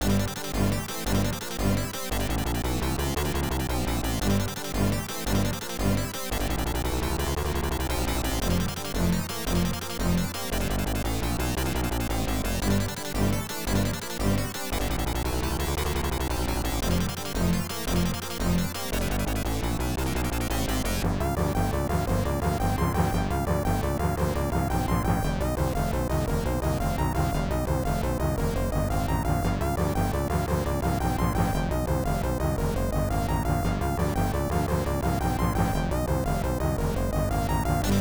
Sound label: Boss BGM; superman